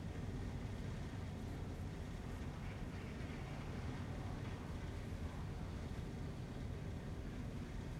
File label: Ambience Basement